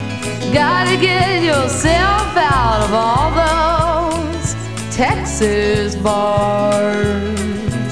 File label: texas howlin